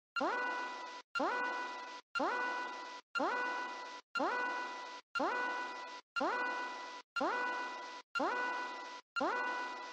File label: bomb timing